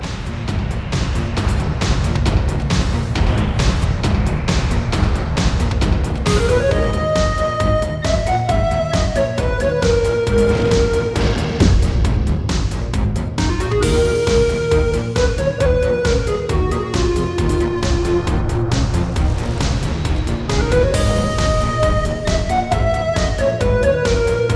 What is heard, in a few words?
Lava Caves; Kelve; Rune Factory 5